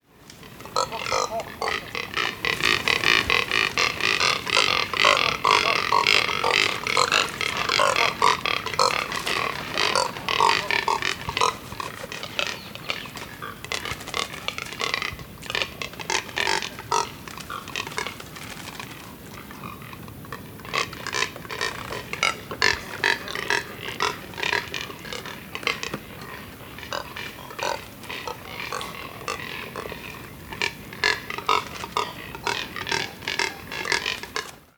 Neotropic Cormorant Phalacrocorax brasilianus; waterfowl

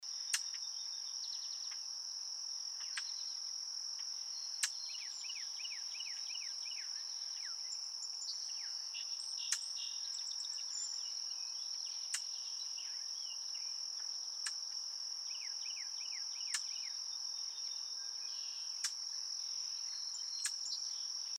Brown Thrasher Toxostoma rufum; thrushes thrashers

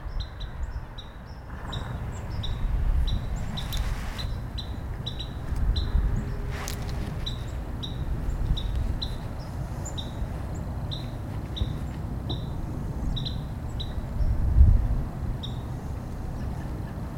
Carolina Wren, Thryothorus ludovicianus

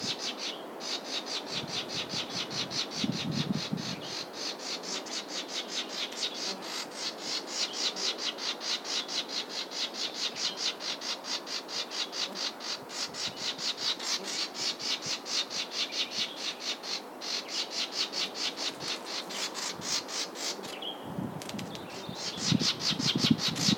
Plumbeous Vireo, Vireo plumbeus; vireos

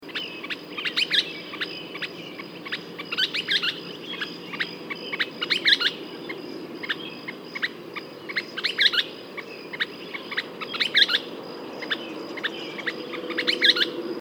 Western Kingbird, Tyrannus verticalis; flycatchers

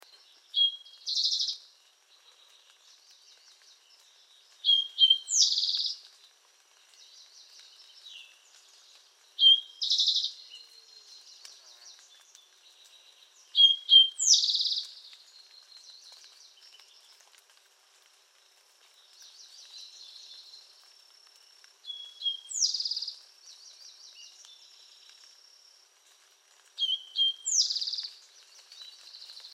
Spotted Towhee Pipilo maculatus griseipygius; sparrows

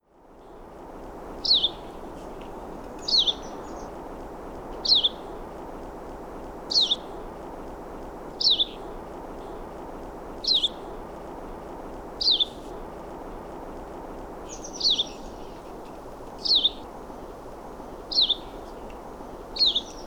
Painted Redstart, Myioborus pictus; warblers

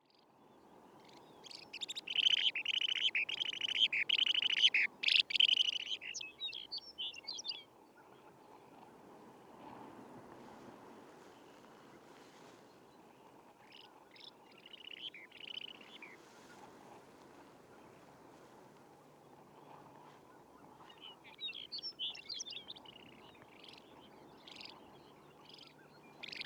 Long-billed Dowitcher, Limnodromus scolopaceus; shorebirds rails

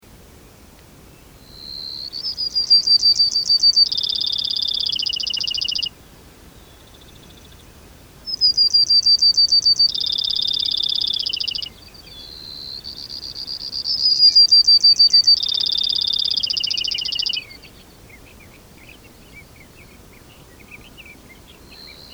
Brewers Sparrow Spizella breweri

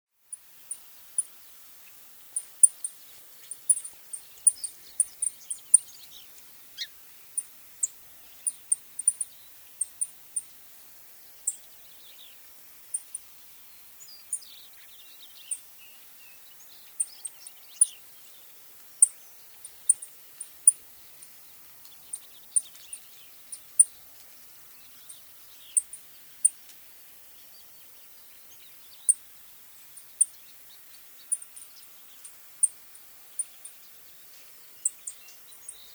Song Sparrow Melospiza melodia; sparrows

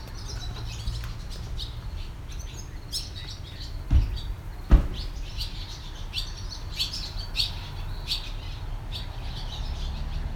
Barn Swallow, Hirundo rustica; swallows swifts

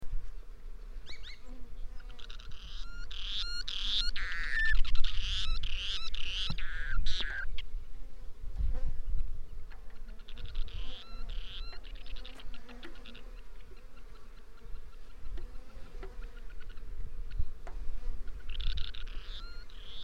Stilt Sandpiper, Calidris himantopus; shorebirds rails

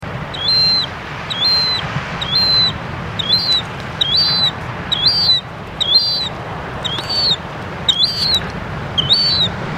Herring Gull, Larus smithsonianus; gulls terns

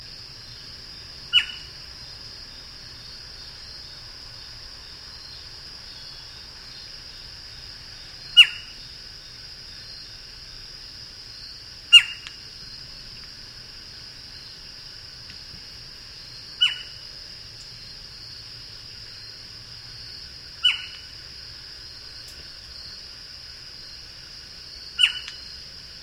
Eastern Screech Owl, Megascops asio; owls

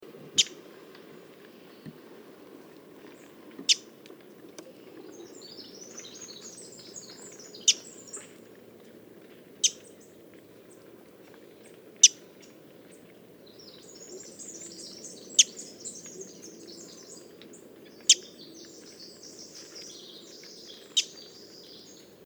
Wilsons Warbler, Cardellina pusilla; warblers

